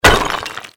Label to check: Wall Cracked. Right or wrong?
Right